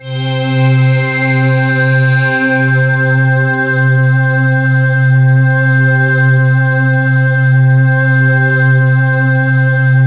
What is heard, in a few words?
PAD C4; Explosive Jungle; USB Soundscan vol.05; AKAI